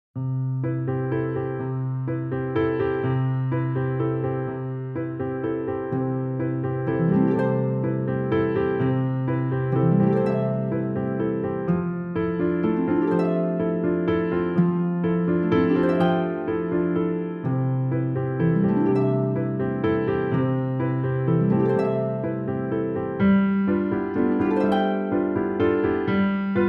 Water Lily Waltz Instrumental

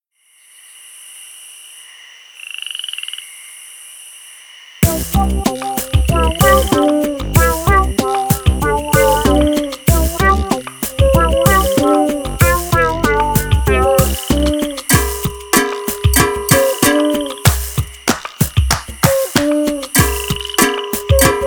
Crawfish Clap Instrumental

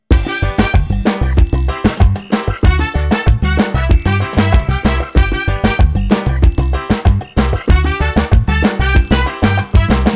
Beach Party Instrumental

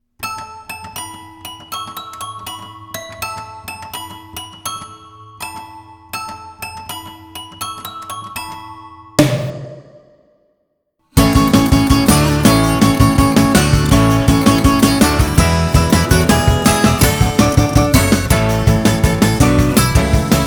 Pop Goes The Dance Instrumental

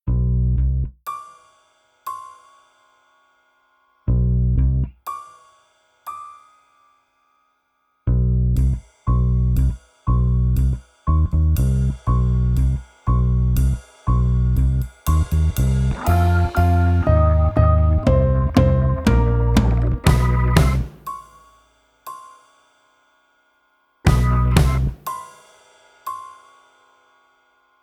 Sneaky Shark Instrumental

